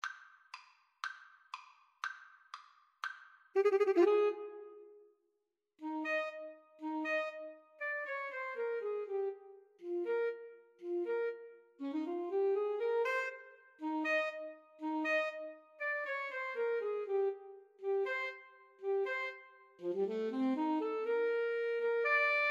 2 sax

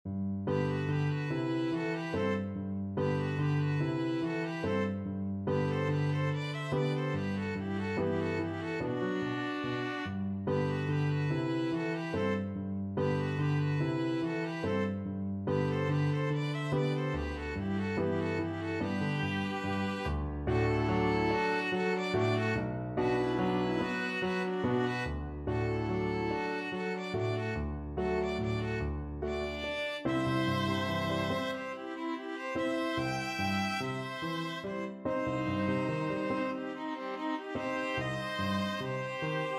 violin viola duet

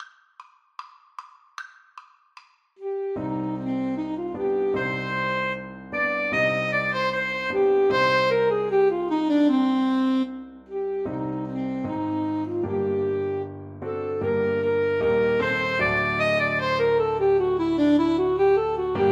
Alto Saxophone Duet

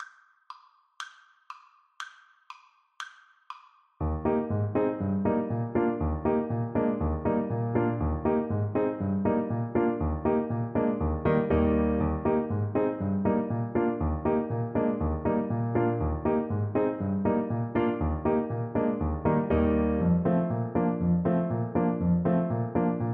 polka, Clarinet

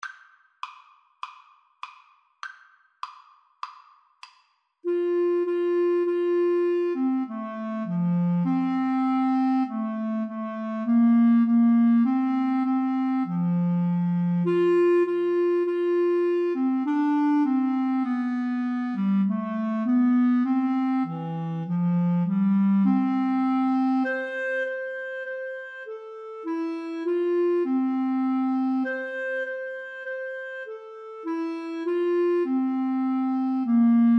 Flute Clarinet Duet; hark the herald

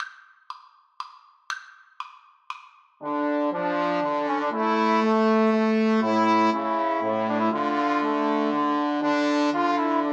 Trombone trio

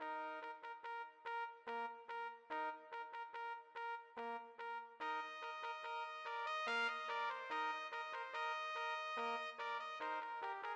trumpet trio

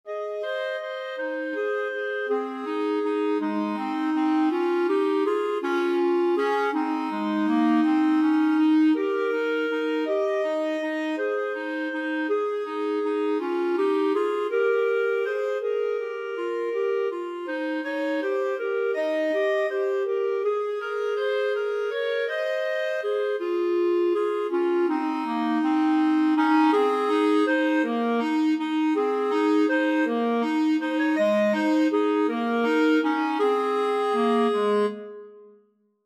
clarinet duet

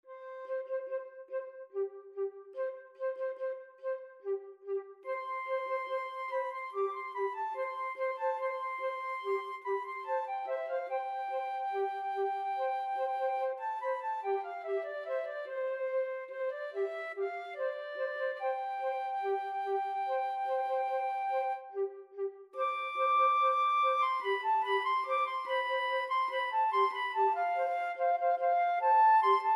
bolero, flute duet; ravel

